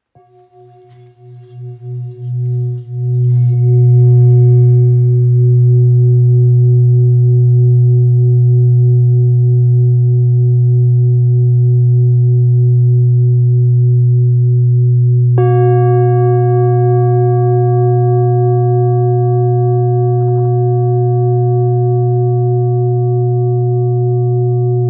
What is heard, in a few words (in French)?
Bol grave si fleur de vie